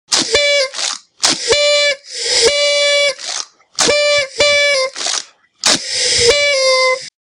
party horn

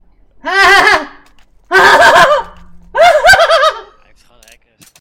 haha

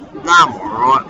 ringtone

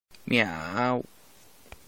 miau

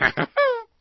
curtain; swampy; disney

